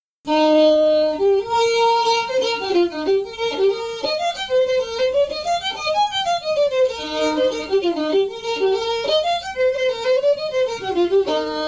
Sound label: fiddle; maid behind the bar